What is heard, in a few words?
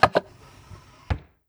menu close